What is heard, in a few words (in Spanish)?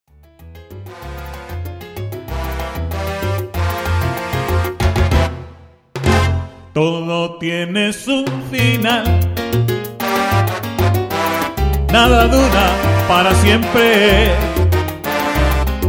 with voice